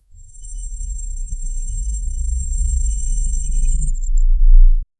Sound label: an object turns once, aesthetic